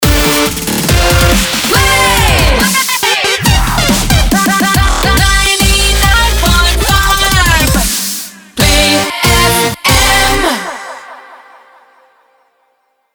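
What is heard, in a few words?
140bpm Gmin MIX Main ID, jingle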